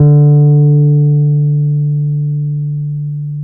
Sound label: PNO, H.Pianet; Roland LCDP10 Keys of the 60s and 70s 2